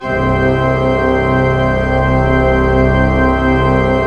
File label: ORG, CHURCH ORGAN; Roland - LA Composer vol. 1